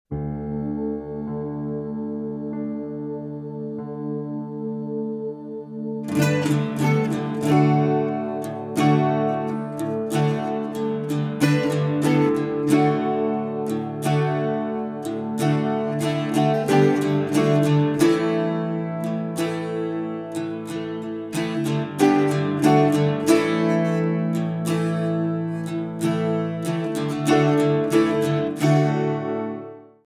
Hymn For Our Fathers small mix, acoustic studio

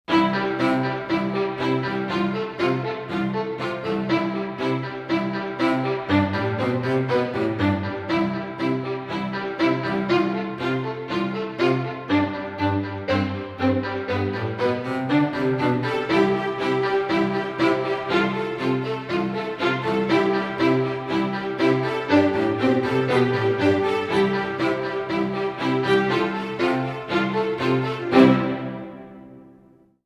Playing The Strings (no drums)

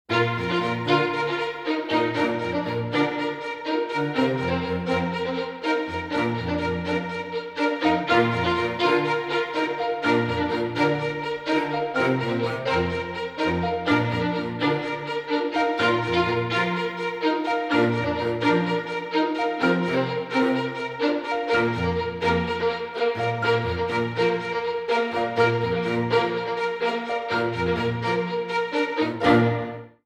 Joyful Strings B (no drums)

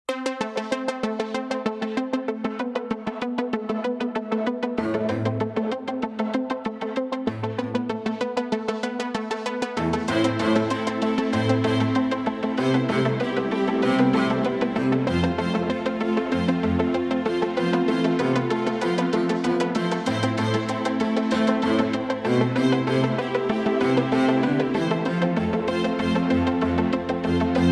String Drama Unfolds (no drums)

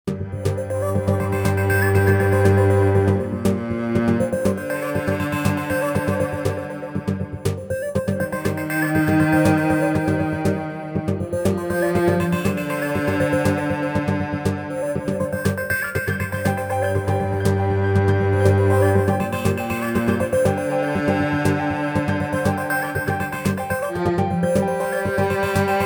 Cello Noir B (no drums)

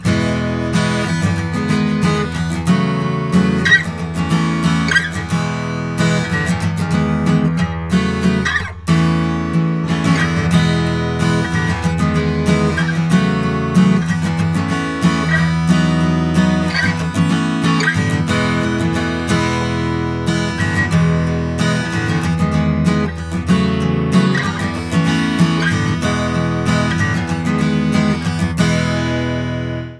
just strumming

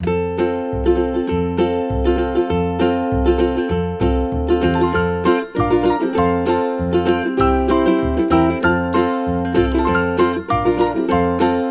Quirky Uke Fun

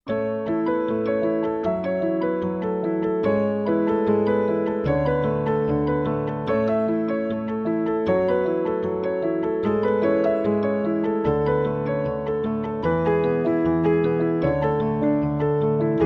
Hopeful Outcome (no cello)

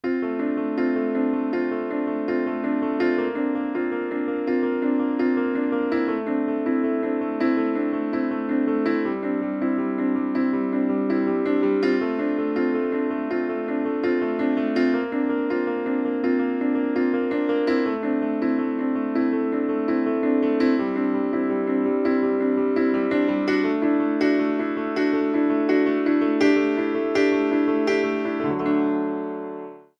Piano

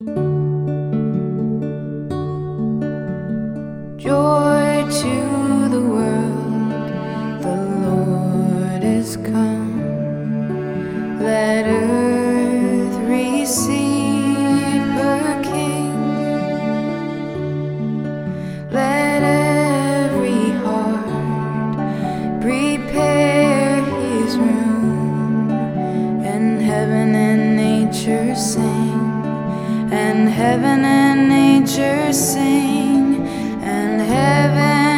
Joy To The World Female

